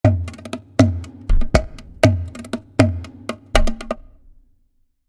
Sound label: percussion